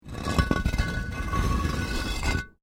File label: Brick Medium Hollow Move